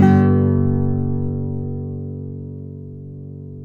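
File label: nylon down major G#; FLAM CHORDS; Guitars; Spectrasonics; Hans Zimmer Guitars VOL-2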